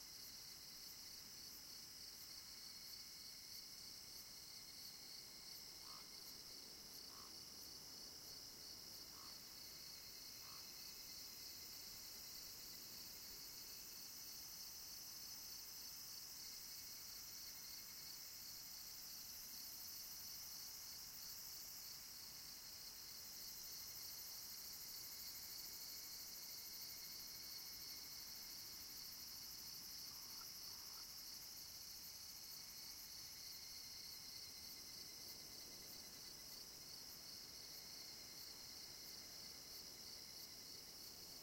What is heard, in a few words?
Outside Ambience